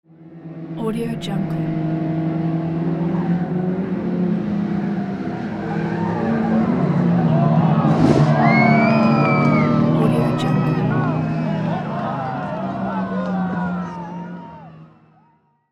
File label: car crash real; alexm76